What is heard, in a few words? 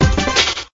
dice stake